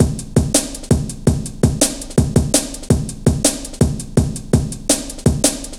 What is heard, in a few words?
Ruffneck, Drum Loops 165.5; Zero-G Jungle Warfare 1, 2 & 3